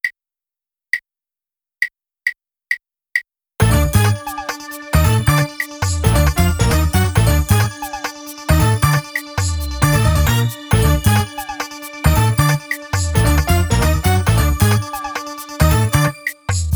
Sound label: drumless